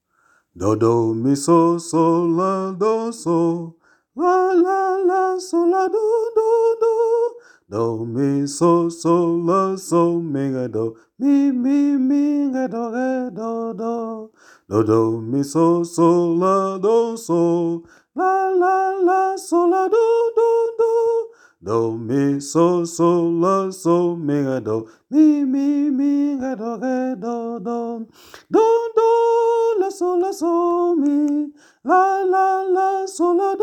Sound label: Sopran